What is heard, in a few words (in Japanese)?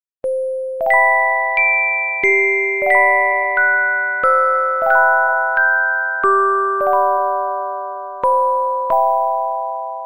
レトロケータイ着メロオルゴール; ジムノペディ